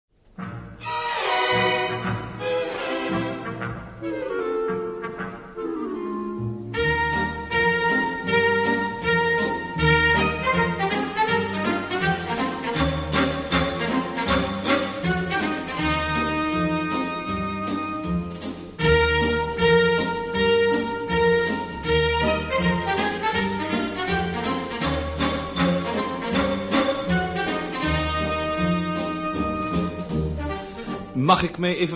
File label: Tune; Mijn naam is Cox